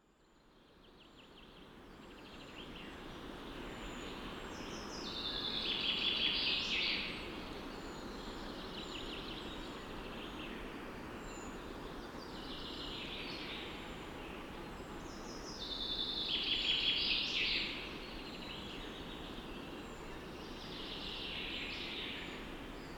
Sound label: Sweden Pine Forest